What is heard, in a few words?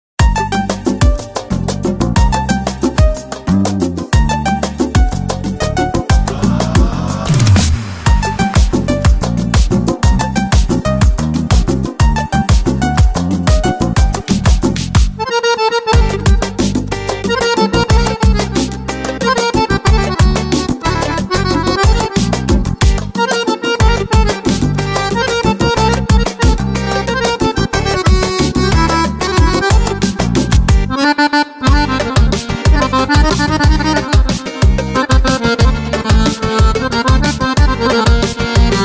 Accordion